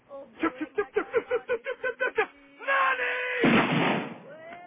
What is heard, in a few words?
chirp chirp